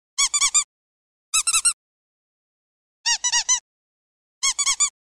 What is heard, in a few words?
Air Horn